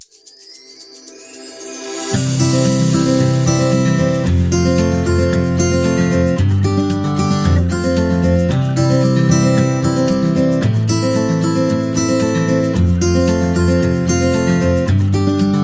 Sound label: Romantic Evening, wedding music